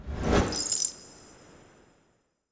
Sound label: symbol In; netent